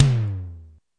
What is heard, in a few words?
med e tom, Toms